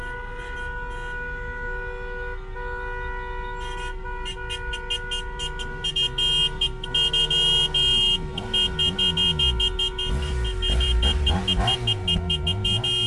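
car protests ambient interior bikers revving with mazda miata red light windows open; stereo; sonniss gdc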